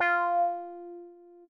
F5 meow

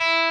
Arp.

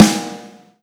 drum hit clap

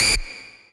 lead

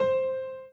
Piano Chord

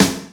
fill snare low

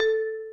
Bell A#4; osu

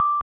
BELL

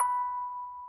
orgel

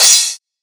crashed cymbals

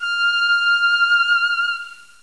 pipe

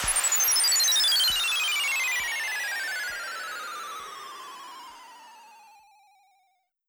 FX Sweeeee; osu

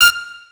Last High F

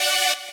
saw

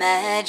vocal

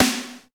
Clap Drum hit

